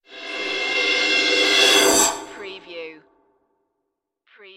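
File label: Production Element Cymbal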